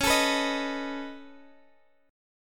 C sharp 7th Suspended 2nd Sharp 5th C sharp Guitar Open G; down; chord shape